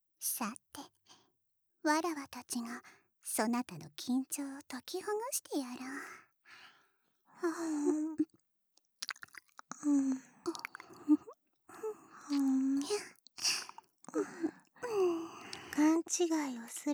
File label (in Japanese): 耳舐め,ASMR; いたずら稲荷に囲われささやき-お狐ロリババア姉妹に白濁酒を要求される甘やか立体音響; 耳舐め玩味の儀